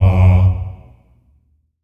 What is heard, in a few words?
Staccato F F#2 R, Symphony of Voices; Spectrasonics